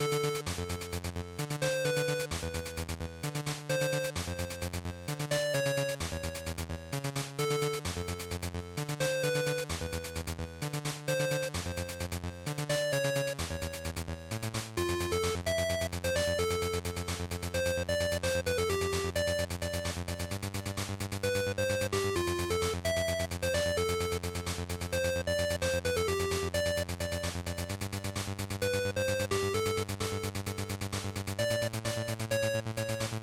Shooter, Stage, Loop

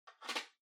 Portable MD Far Charging Stand Put Down

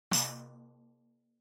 Tambourine Open

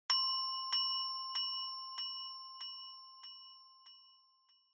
Ding Delay; Onoma

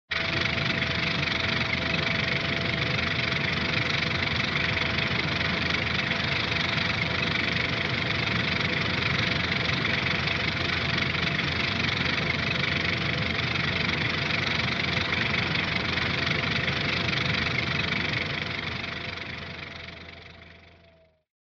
Retro Anime Caterpillar Tread Low

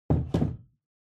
Pendulum Clock Hardware Hook Hang

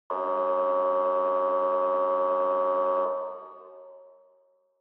Police Car Siren Far Buzzer

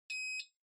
Ceiling Light Beep

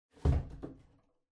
Cardboard Box (Far Put Down Empty); ダンボール03-28(遠い 置く 空); 効果音＞梱包材

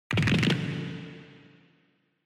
Fracture Reverb

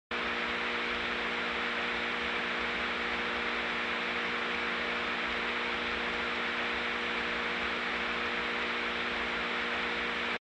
TV Signal Noise Retro

